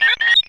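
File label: sphere blips medium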